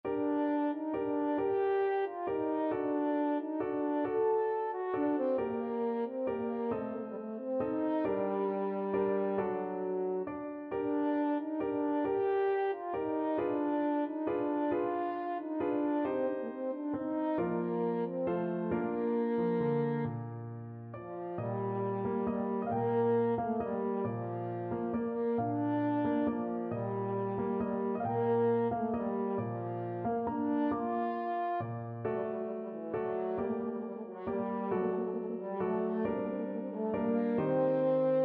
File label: french horn